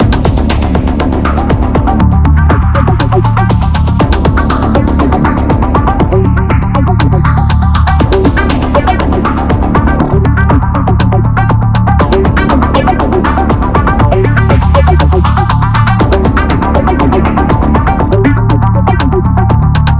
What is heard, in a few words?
Music Electro Combat; Synthetik Legion Rising